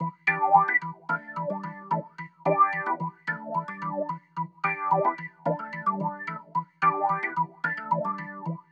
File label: Wah Wah, Organ, Loops, Effected; Sonic Foundry (Sony Creative Software); Joe Vitale Organ Donor